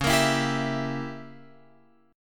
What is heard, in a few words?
Db Diminished 7th Db down Guitar Open F; strummed; JGuitar